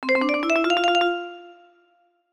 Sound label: Jingles Chest